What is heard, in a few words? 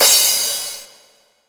Cymbals Crash; Vengeance Samples